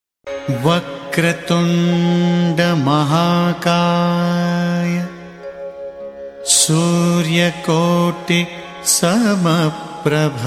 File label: vakratunda mahakay